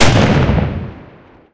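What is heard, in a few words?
breda safat 77 gun shot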